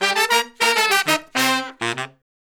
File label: Horn Section